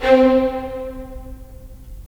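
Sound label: strings violins, right; Roland - LA Composer vol. 4; 90 s Sample CDs; Violins Sh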